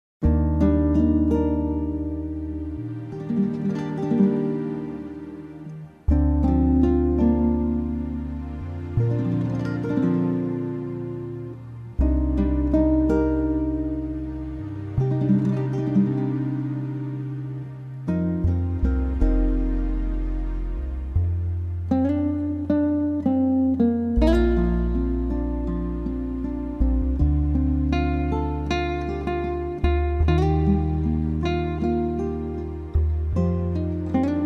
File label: Acoustic Praise Instrumental